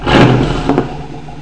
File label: Corvette exclamation; Desktop Studio 2000; Autos; C5Corvette